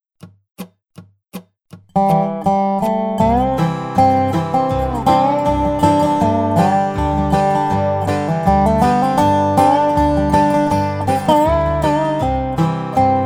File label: Key of C Dobro and backing track